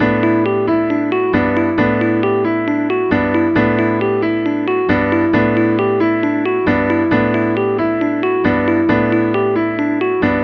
Piano Loop Slow; LG Qua tab PZ LGT32; ringtones

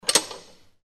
machine, ui

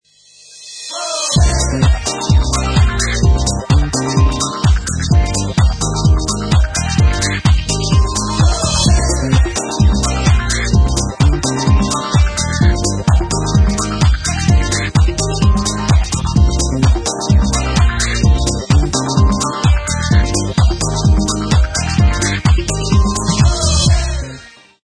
bump; Motorola ROKR E2

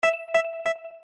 lower battery; ZTE T203

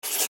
Key Tone

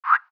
haptic lock wall hover absorption; Sharp AQUOS sense lite SH-M05; sharp audio

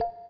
raindrop; Samsung SGH-i617 BlackJack II

